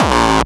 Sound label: Bassdrum